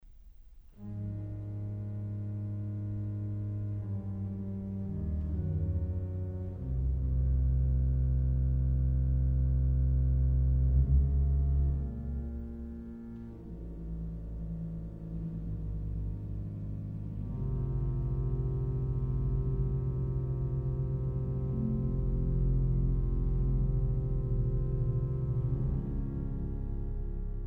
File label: Organ Sonata in C Minor; Psalm 94